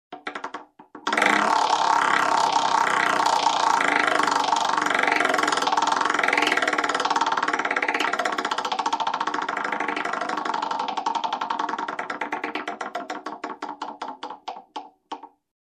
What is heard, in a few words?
Roulette Spinning